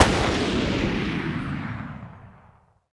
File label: Hits & Explode